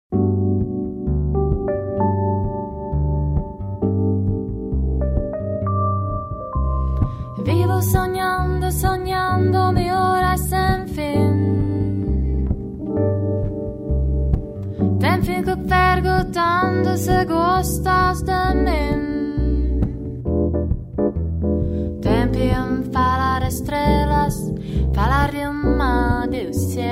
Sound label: trio